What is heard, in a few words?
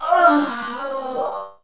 scrm; The Elder Scrolls III - Morrowind